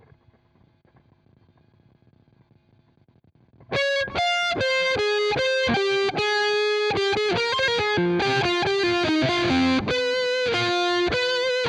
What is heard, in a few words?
Guitar